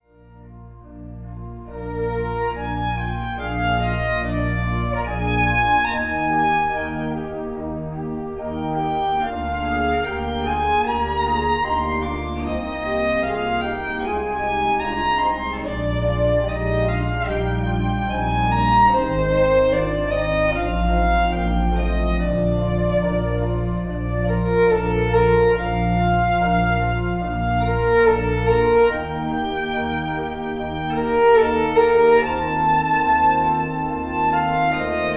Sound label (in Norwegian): vinteren vivaldi fiolin